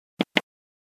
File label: Radio button click